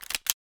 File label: weapon change; kenney; tactical shooter